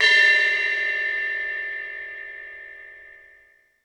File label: Fusion Stix, Drum Machines